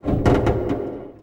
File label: metal strain